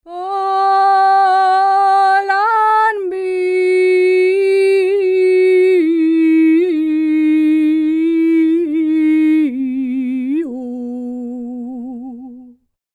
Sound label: SERBIA, Vocal World Voices SERBIAN